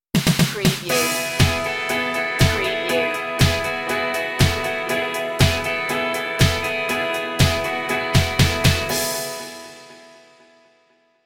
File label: in the wind stinger